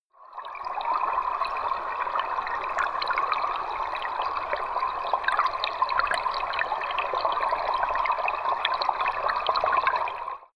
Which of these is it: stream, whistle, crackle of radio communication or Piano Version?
stream